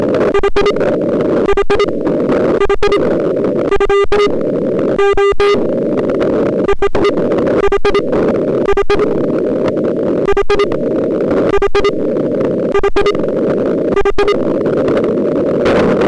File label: echo